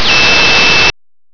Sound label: ray